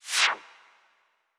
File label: Side Window Scroll Out; D4DJ Groovy Mix; Android, iOS, Mobile